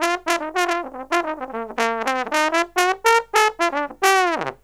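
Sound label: trombone, Killer Brass Riffs; USB Soundscan vol.29 - Killer Brass Riffs; AKAI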